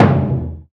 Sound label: PRC LOUD; Roland LCDP14 Africa VOL-2; Afro Menu 1